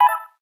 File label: doremi; SKY IM-A730S VEGA S; keytone